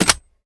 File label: reload empty drum magin; reloads; gmod; iw8